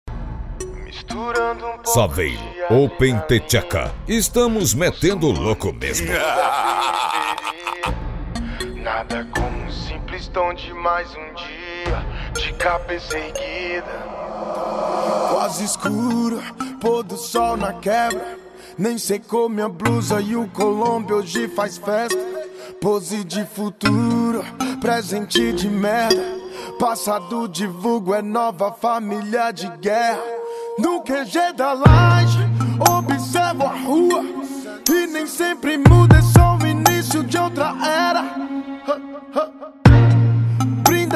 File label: bass; saveiro open the theca